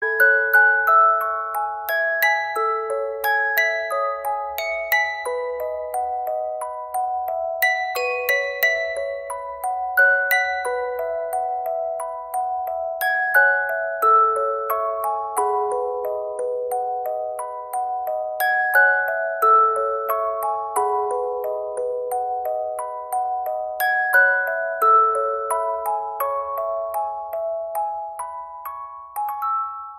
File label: Beats